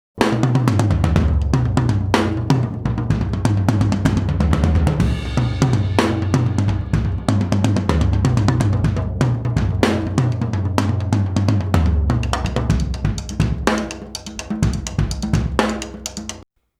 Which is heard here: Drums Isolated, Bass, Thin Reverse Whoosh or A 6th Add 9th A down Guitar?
Drums Isolated